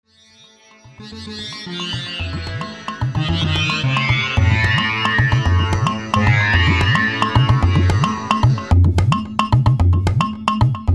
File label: jingle